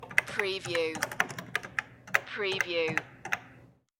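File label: Cash Register Buttons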